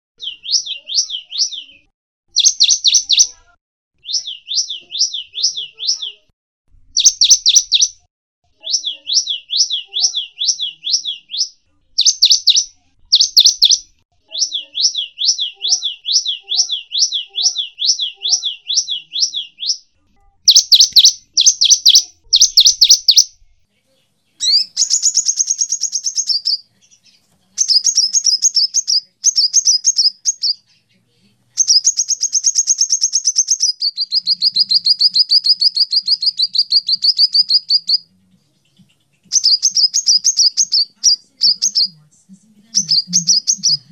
kombinasi glatik batu dan kolibri

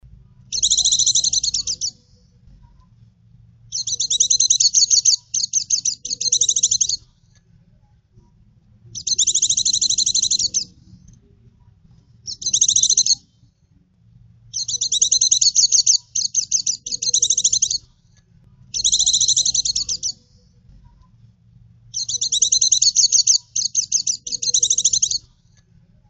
pleci ngecir

